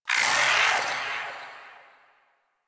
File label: Shadow Vocal Death; Warframe; Duviri